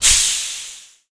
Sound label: N2O trigger, engine; AssaultCube; Alien Stars; American Muscle Series